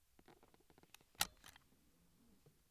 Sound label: Seat Bealt Click